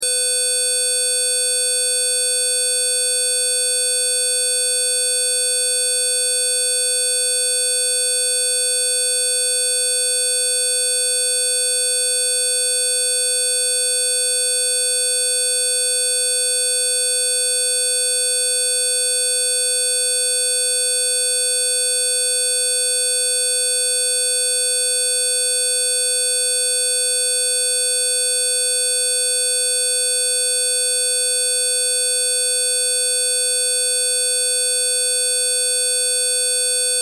Test Tone Square; Wave 12.5Khz 60 Secs 0Db Loop; Blastwave

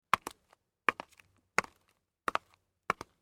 Footsteps High Heel Shoes Walk

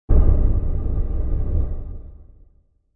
Flame; Sound Design

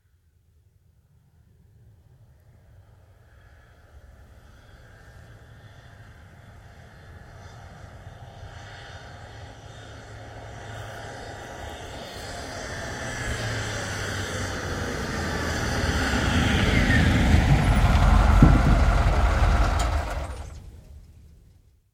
Ambulance approach and stop, idle, off, no siren; Emergency Vehicle Sound Effects; BBC

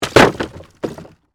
Impact Wood Palette, Drop, On, Palette; Materials; BlastWave

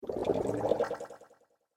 Water Space Bubbles, sci fi

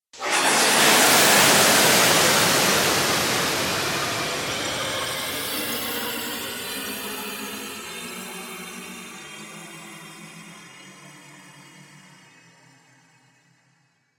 Ghost Big But Vanquished; horror; Ghost Sound Effects

